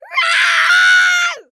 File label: VOICE Girl 4yo Scream; don t be afraid the first toy